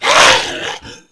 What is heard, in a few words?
leap; svencoop